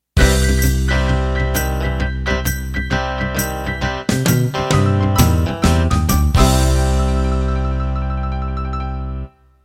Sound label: Country Shuffle End